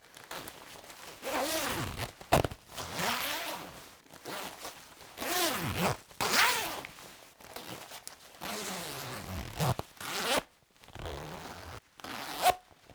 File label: JACKET NYLON MOVEMENT ZIPPER SLOW FAST OPEN CLOSE; Cloth Foley; The Soundcatcher